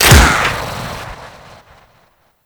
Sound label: Infested Dart Pistol Shot; Warframe; Weapons